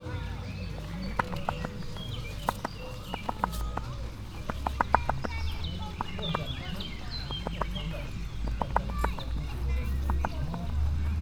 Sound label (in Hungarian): tollat csőrével tisztogató madár, rózsás gödény; allathangok; veszpremizoo 2013